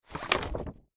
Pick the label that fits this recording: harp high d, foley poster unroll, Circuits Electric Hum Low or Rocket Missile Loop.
foley poster unroll